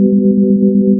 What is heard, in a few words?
twiddle; Metareal; MetarealAudioEditor